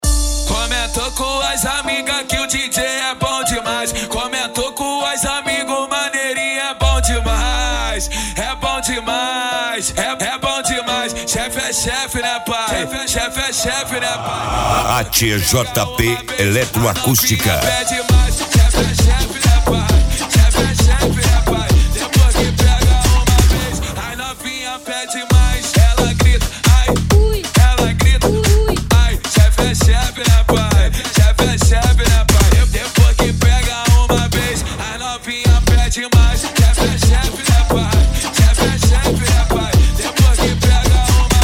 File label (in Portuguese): ElectroAcustica Ao Vivo; de voltas as origens; eletrofunk das antigas